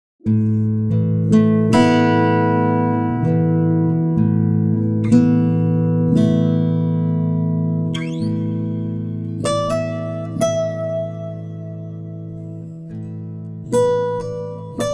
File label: guitar, timestretch; prosoniq time factory mpex3